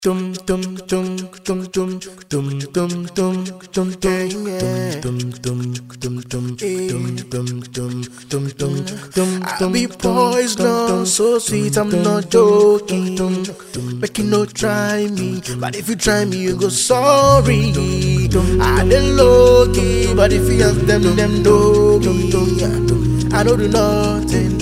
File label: Acapella Cover